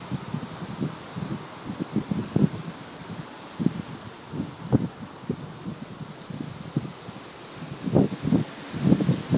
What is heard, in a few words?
windy